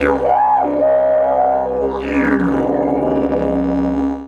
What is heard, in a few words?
C#1 WILD, Didgeridoo; Big Fish Audio - Didgeridoo and Other Primitive Instruments